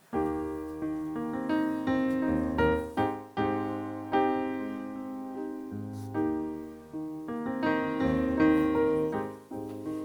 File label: Sops, choir